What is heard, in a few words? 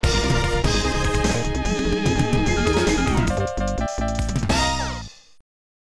Match Start; power spikes ii arcade; World Men League